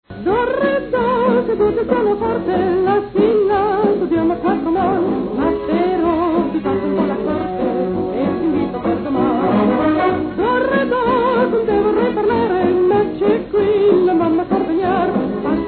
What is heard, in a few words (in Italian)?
Orchestra